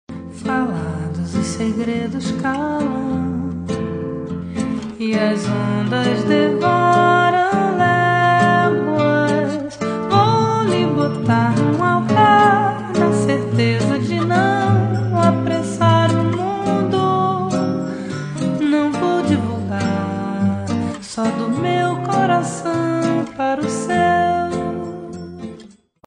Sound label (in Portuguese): Bossa